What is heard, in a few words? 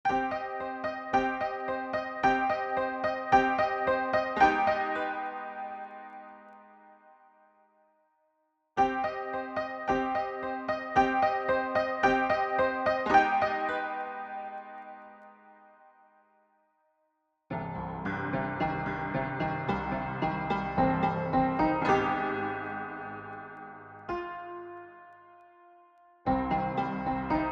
Broken Piano